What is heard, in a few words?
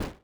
step forest